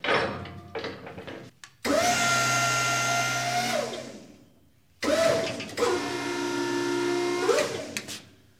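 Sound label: Forklift Electric Movement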